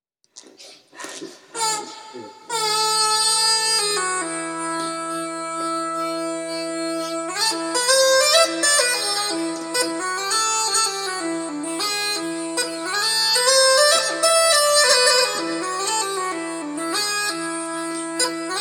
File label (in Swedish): Polska säckpipa; Ärtbergs Kalle; efter Erik Pettersson Karlskoga